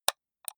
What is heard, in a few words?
computer mouse left click single slow hard